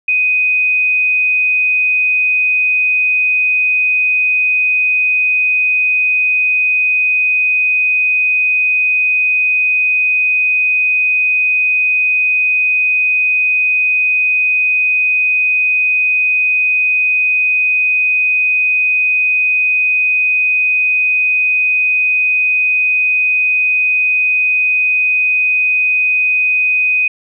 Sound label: Cab Signal Overspeed